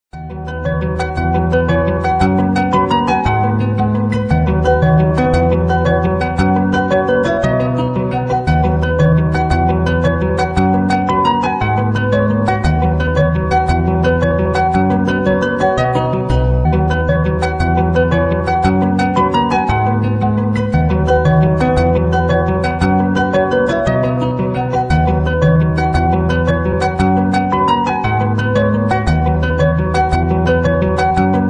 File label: Vivo iQOO 12 Ringtone